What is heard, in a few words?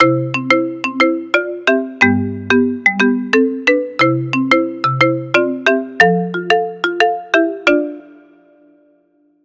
Marimbas; Mobicel Onyx; ringtones